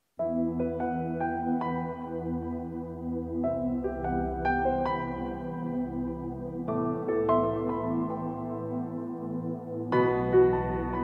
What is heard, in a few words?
acoustic version